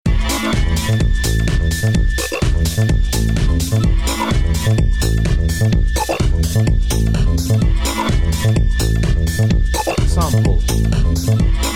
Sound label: electro, backing